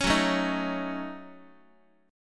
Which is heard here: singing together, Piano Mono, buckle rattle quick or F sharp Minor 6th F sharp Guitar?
F sharp Minor 6th F sharp Guitar